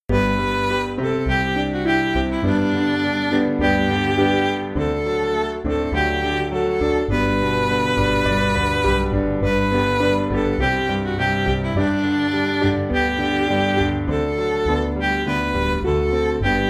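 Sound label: Midi, Backing Files for Churches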